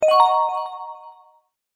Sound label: lvl up; abilities; UI